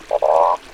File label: Boana crepitans